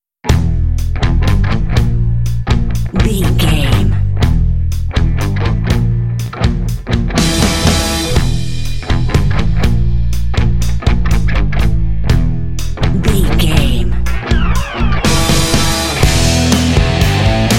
raw rock n roll no strings

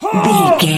voice male attack

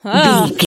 voice female expression emote

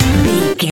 retro collect item stinger

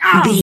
voice male hurt pain

